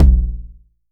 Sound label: TOMS 909; Wizoo - Platinum 24 Electronic; CHR TOMS 909